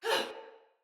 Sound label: Surprised, Soldier Female; Battle; Barks; Dialog; Sinecure Soldier Female A